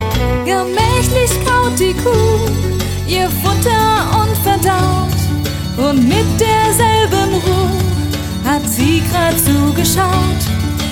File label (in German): Lied